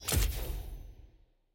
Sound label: lobby button main click